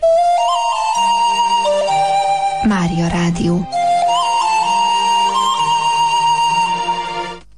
JIngle; REGGELI MUSOR SZIGNALJAI; STUDIO GYULAFEHERVAR

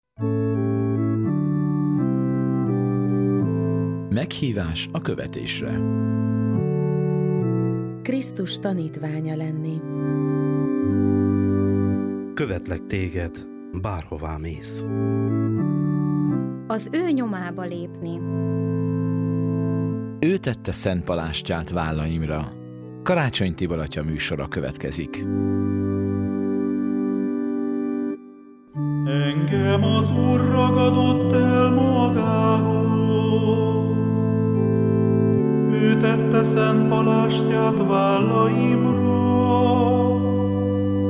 Jingle; O tette vallaimra szent palastjat

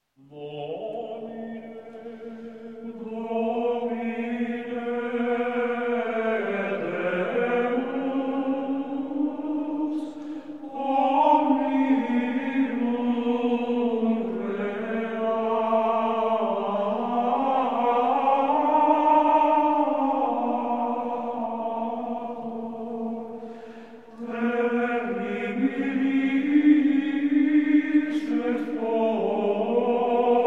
Ambrosianischer Choral, Gregorian chant; Missarum; Post Evangelium; Domine, Domine Deus

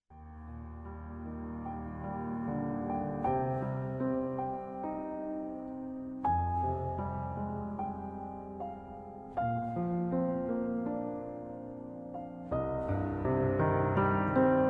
instrumental

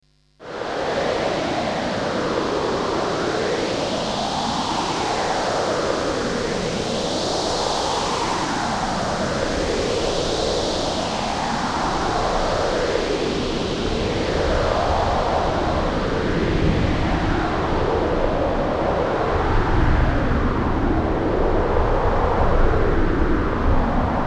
hurricane storm nature sounds

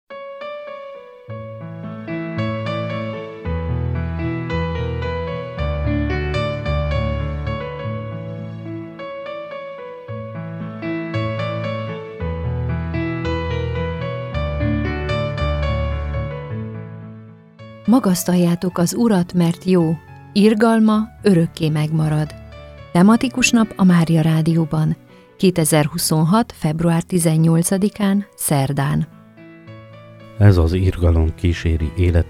Jingle; Tematikus napok; Magasztaljatok az Urat mert jo

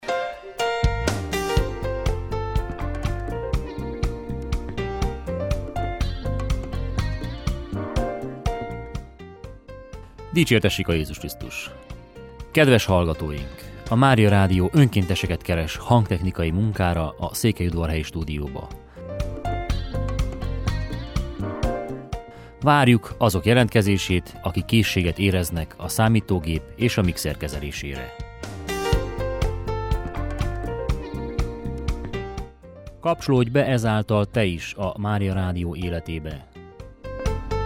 jingle; Udvarhelytoborzo

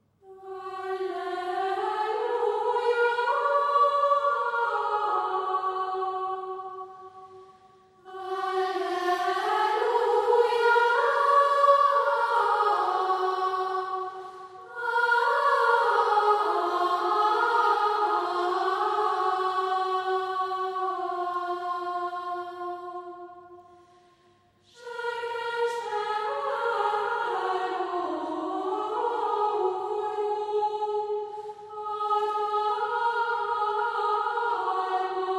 Advent Gregorian Chants; STUDIO GYULAFEHERVAR; Adventi Zenek

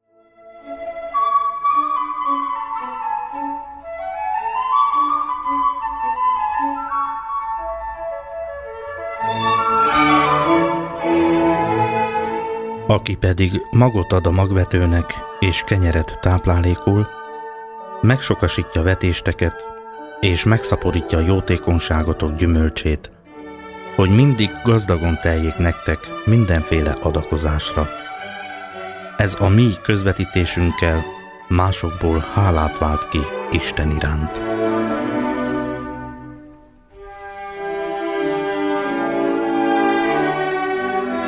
Jingle

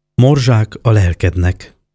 Jingle; MORZSAK A LELEKNEK

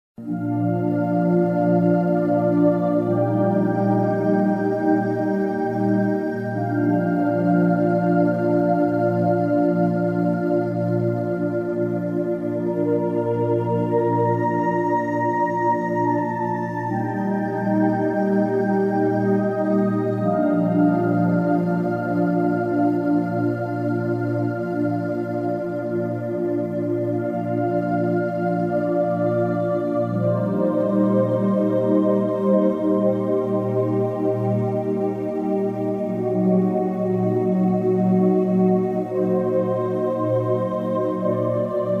instr; oltariszentseg; Reggeli Musor szignaljai

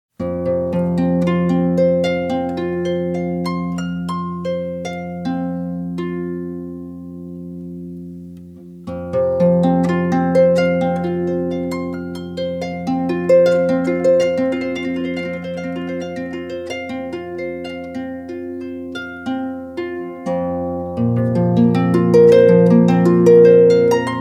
Whispering Waves In F, Hárfa

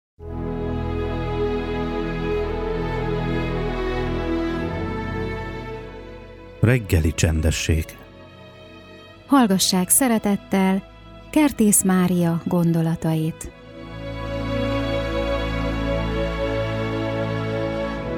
SIGNAL; Reggeli csendesseg

